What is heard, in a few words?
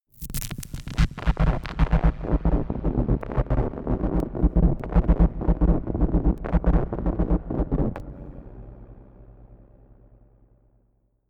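crushed fake thunder, science fiction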